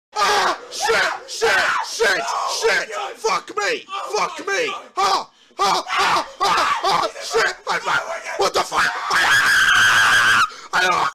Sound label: screaming